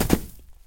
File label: grass land; Golden Crayon Sounds